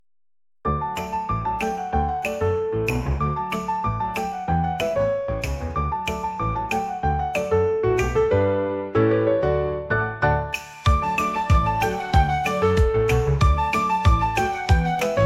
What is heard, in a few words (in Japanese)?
ピアノコミカル